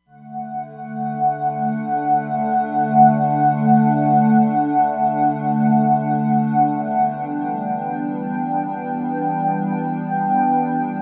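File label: Square Pad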